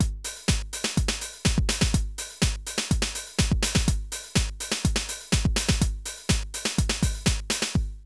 drum loops, House & Garage

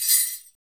BEL, Orchestral Kit; Roland LCDP03 Orchestral Perc; Orch Combo 1

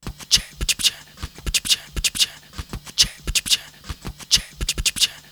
Beat Box; Sonic Foundry (Sony Creative Software) - Fluid Dynamics Drum & Bass; Construction Kit

